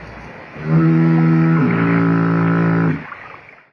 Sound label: foghorn